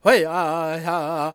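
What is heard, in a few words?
E minor, World Voices, Lakota; Spectrasonics Vocal Planet CD5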